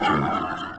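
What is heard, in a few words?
dead pig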